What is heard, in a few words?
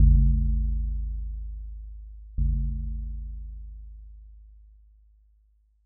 joya bell